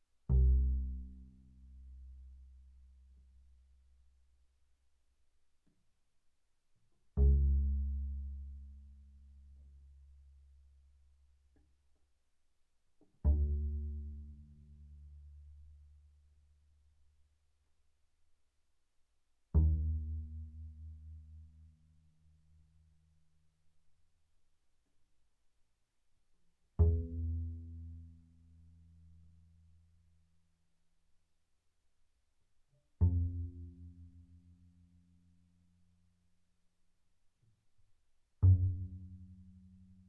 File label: Bass pizz sul A mf C2 B2, double bass